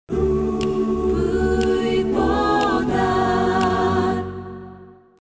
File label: jingle